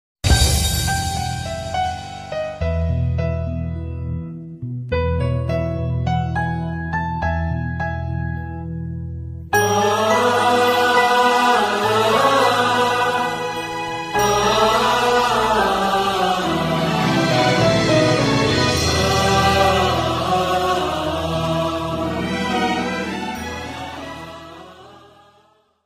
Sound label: Ringtone Piano